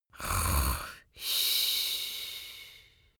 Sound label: snarling male; menschen; koerpergeraeusche